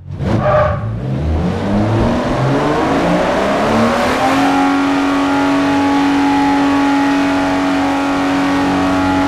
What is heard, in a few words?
rev, vehicles; reventon; TDMCarpack; TDMCars